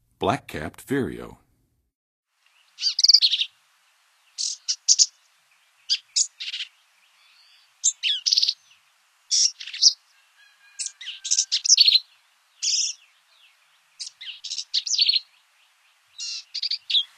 Black-capped Vireo, Songs of Birds; North America; Western USA; Western USA Songbirds

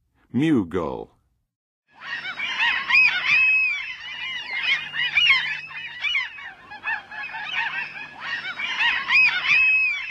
Mew Gull, Birds of North America; Western USA; Western USA Songbirds

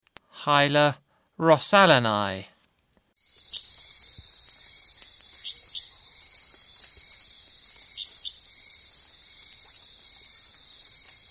Hyla rossalleni, Frogs of Ecuadorian Amazon; S. America

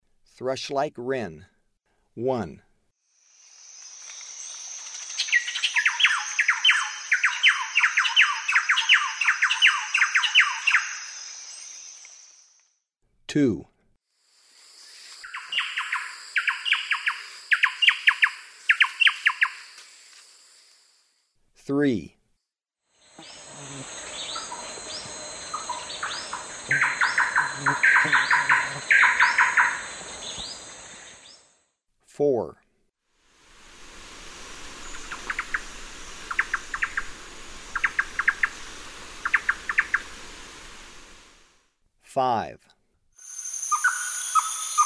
Thrush-like Wren; S. America; Eastern Lowlands

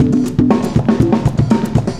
cooling 120, loops, 120bpm

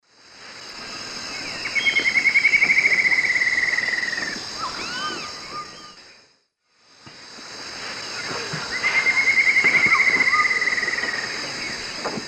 Dendroplex picus, song; S. America; Eastern Lowlands

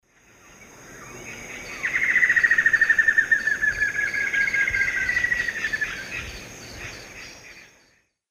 Dendroplex picus, duet; S. America; Eastern Lowlands

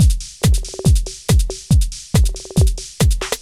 techno 140

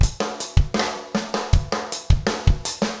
trip hop rims

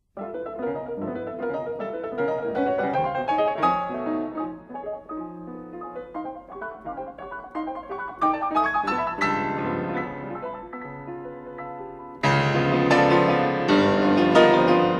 Etude for piano in G major; Discovered Piano Treasures

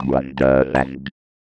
Wonderland, Vox Loops; Ambient Cinematic

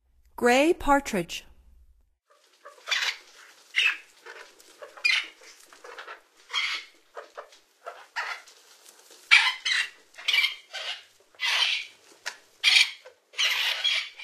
Gray Partridge; North America; Stokes

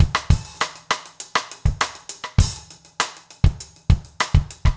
basic funk 100 rims

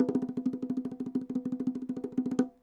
bongos licks, latin hits n licks

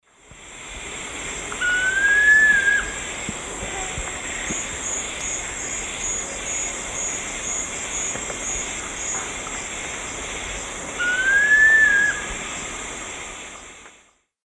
Ramphotrigon ruficauda, song; S. America; Eastern Lowlands

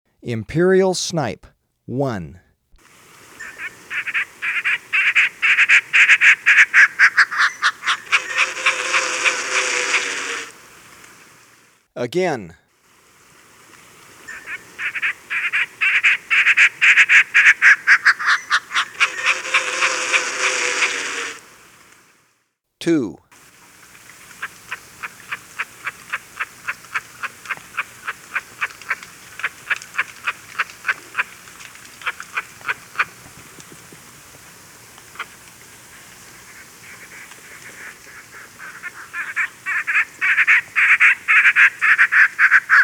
Imperial Snipe; S. America

